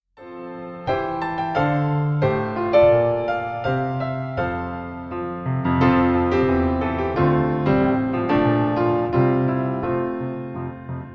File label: Piano Meditation